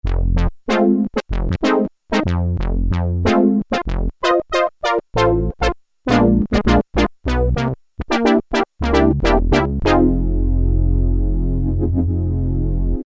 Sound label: Purcussive Brass, Korg Poly-61M